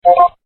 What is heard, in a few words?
message sound